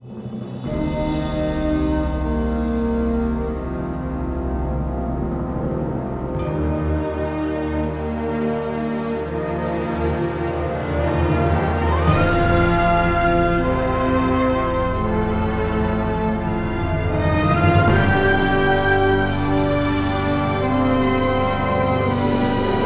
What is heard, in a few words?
Loop Base; Planetary Annihilation